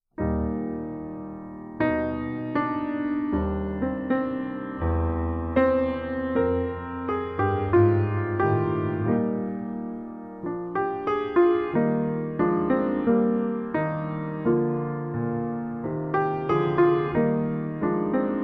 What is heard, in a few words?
panis angelicus